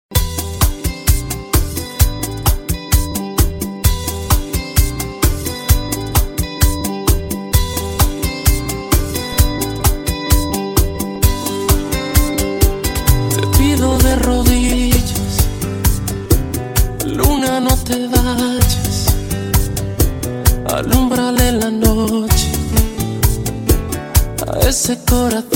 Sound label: INTRO BPM 130, BACHATA